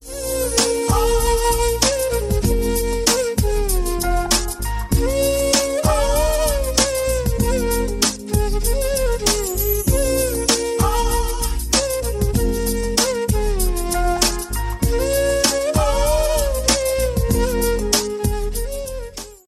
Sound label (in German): bollywood klingelton, indien hindi